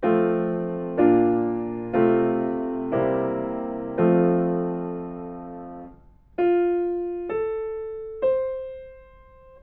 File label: melodic fragments; ear training